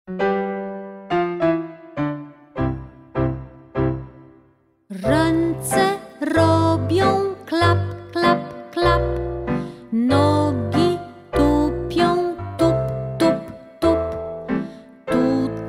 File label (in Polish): Ręce klapią klap klap klap wolna wersja